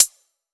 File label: Hat, Closed Hats